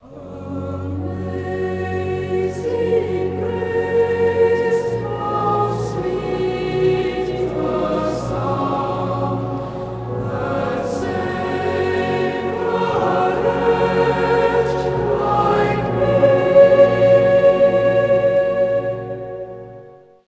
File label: prot hymn; Compton's Reference Collection